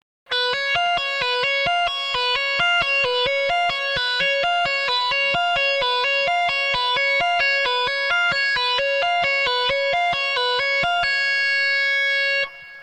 tap

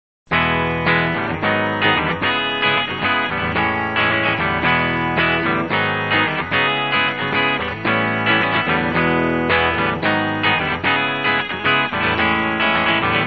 i iii vi iv prog

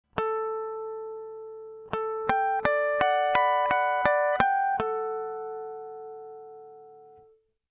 harmonic example

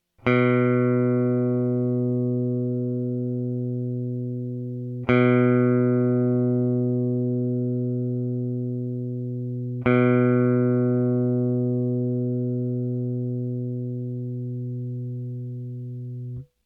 open e low b

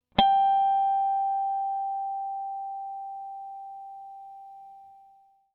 harmonic example

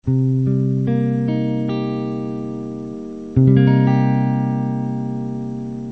cm7 barre

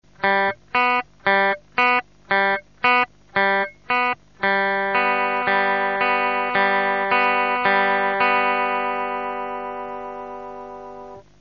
ear tune

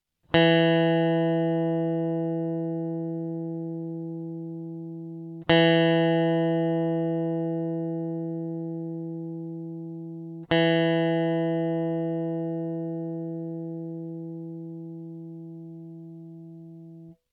drop b; Drop tuning your guitar is simply where you tune the bottom string

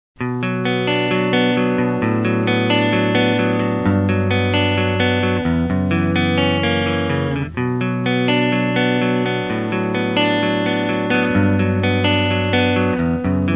bm tension

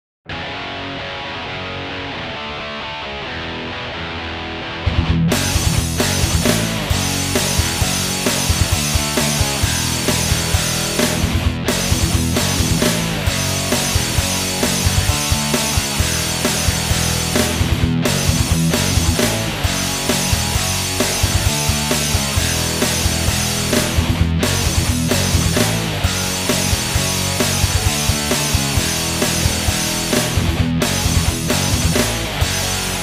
natural minor

